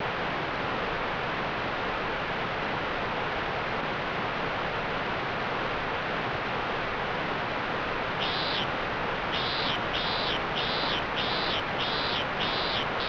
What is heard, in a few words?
Stream Cicada; kawa no nushi tsuri 2; snes